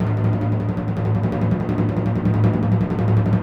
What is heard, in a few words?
TMP A#, Percussion; ILIO; Synclavier Percussion 2; World & Orchestral